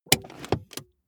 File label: car bmw e46 interior handbrake release short